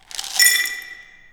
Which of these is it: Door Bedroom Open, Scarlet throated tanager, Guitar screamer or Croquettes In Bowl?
Croquettes In Bowl